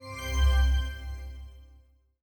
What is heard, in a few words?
screen on; braillesense